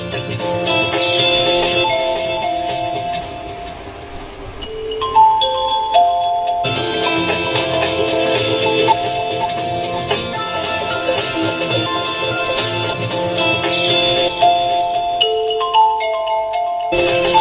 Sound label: OPPO f9 Original Ringtone